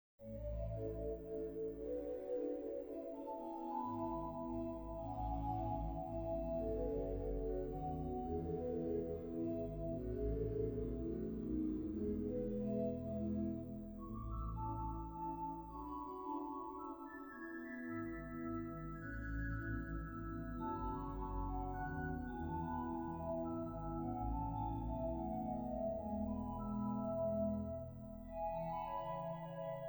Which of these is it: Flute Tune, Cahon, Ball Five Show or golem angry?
Flute Tune